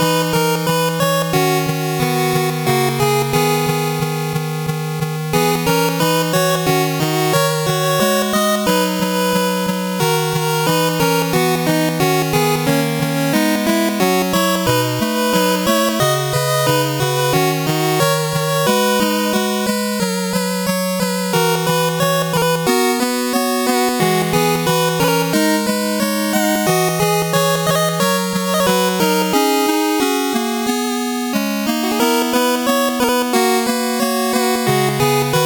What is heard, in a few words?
8bit bgm normal